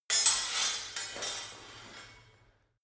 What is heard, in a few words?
sword parry sword